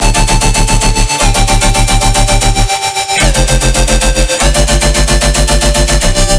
Crunchy50 Dm

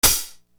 Hi Hat; Sonic Foundry (Sony Creative Software); Infinite Soul VOL-1

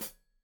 Hat Foot, Hi-Hat, Cymbals Stereo

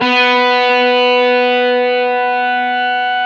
GTR SOLO B2, ROCK GUITAR; Greytsounds VOL-1; ROCK GUITAR1

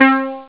stac synth; jazz jackrabbit 2; M8

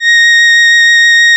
ORGAN, A#; Big Fish Audio; Synclav FM

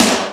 Roland R-8 Snare, Drums

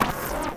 CYBER DOOR, CYBER TOYS; Spectrasonic Distorted Reality 1

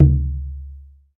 Tenor Drum; Planet Earth; Digital Sound Factory Planet Earth

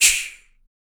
CYMBAL, MALE PERC

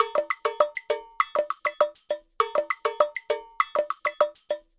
Ensemble, Latin Percussion

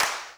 CLAP, Dance Drums

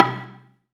BELA2 C2, BELAFON, Asian Traditions; USB Soundscan vol.60; AKAI

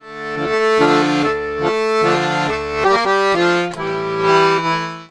140, Complete Accordions

